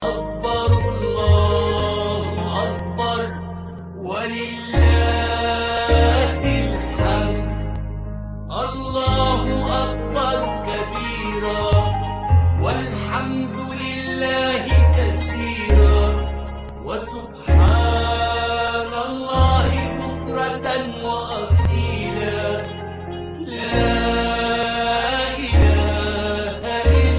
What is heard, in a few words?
takbirat